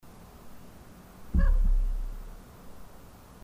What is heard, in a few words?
cat maybe